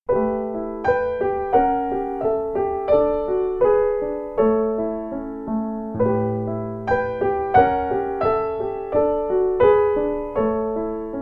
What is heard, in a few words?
Piano Suave Relajante Chill out Music Piano Music Relaxing Mood Piano Loop